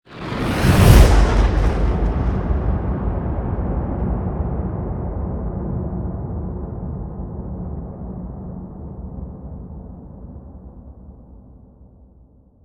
teleport; firefight

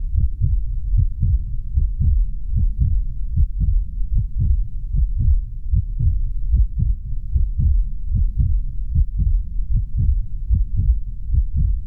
HEARTBEAT; Inquisitor 3

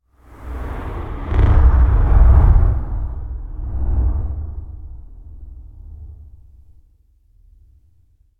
Whoosh Sub And Deep Shaking; Hollywood Edge; sound designer's toolkit v2

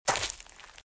Footsteps - Leaves - Walk - Left; RadwinIsland

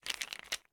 Medicine Bottle Shake; Medical; Blastwave

